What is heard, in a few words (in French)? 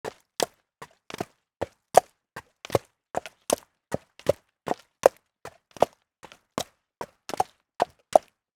cheval sur beton